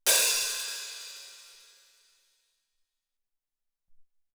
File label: O Amb Hat, Alesis SR-18